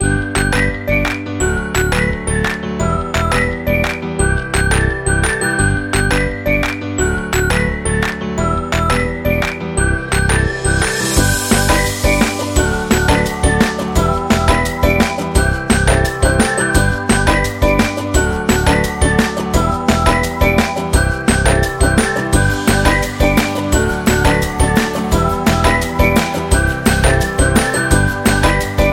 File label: Upbeat Folk